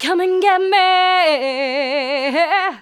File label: Female vocals and robo-vox